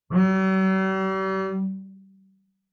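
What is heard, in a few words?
Bass arco fortissimo sul D Gb3, Double Bass; MIS Pitches - 2014; Strings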